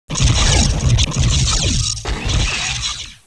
laser; Babylon 5 - Entertainment Utility

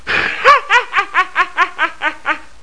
hahaha

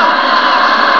Menu Popup; Cowboys

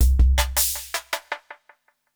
PERCUSSN, Ambient, Drum

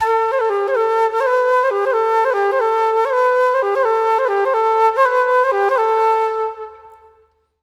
FLUTE ETHNO 125; eJay

